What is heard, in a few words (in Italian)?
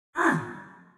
Gemito Umanoide Oscuro